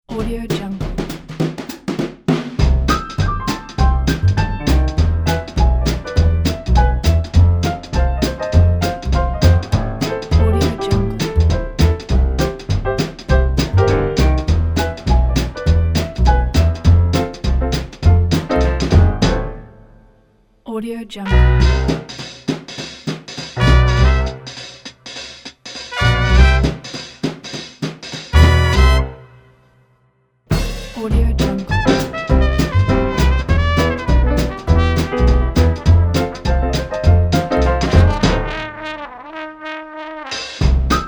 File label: upbeat jazzy piano swing